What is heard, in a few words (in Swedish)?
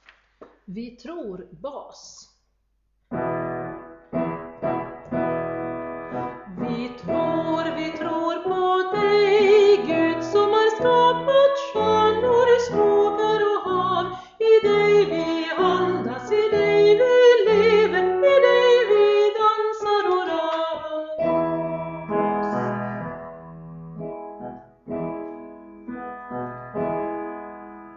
Vi tror bas